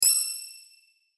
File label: Hit High Shimmer, Hit High Shimmer Warble; Blastwave